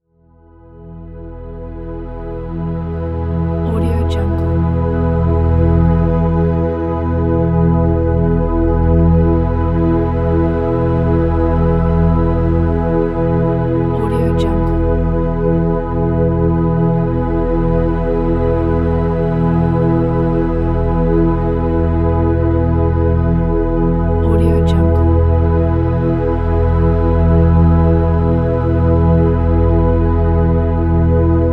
drone; aurasonic

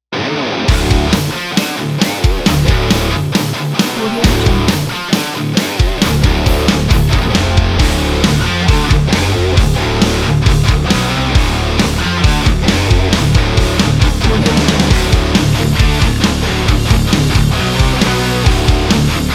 hard rock

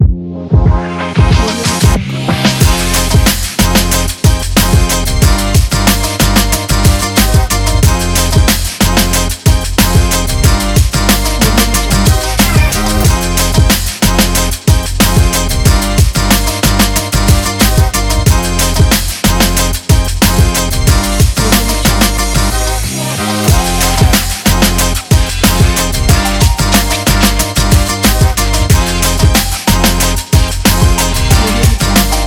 hiphop

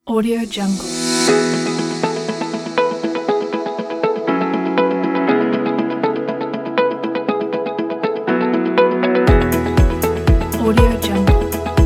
successful motivational pack

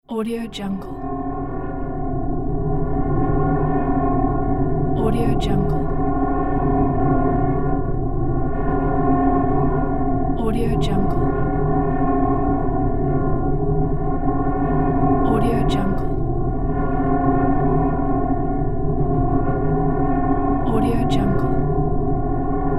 ambient dark idea

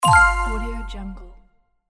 correct answer

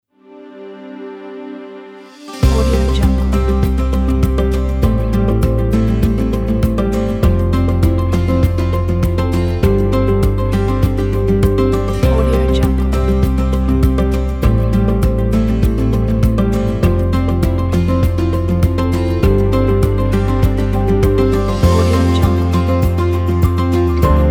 motivation gentle upbeat positive

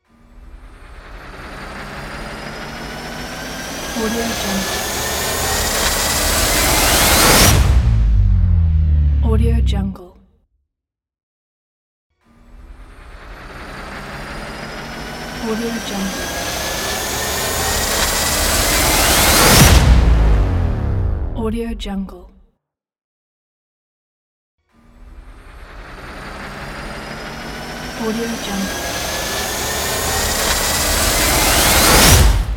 trailer riser

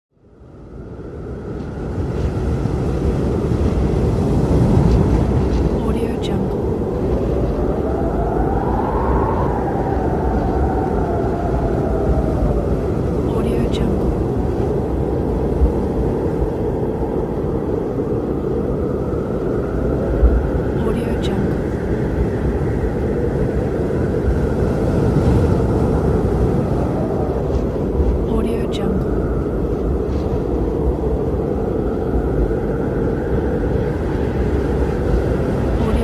blizzard

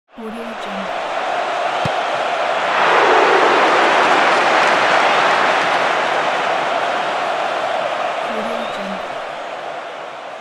soccer kick and almost goal

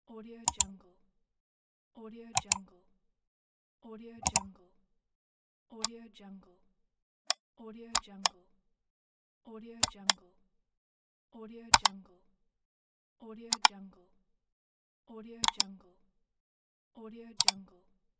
mouse clicks; pure-noise

